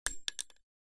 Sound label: cart